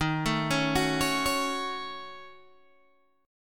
D sharp Minor 7th Flat 5th D sharp down Guitar Open G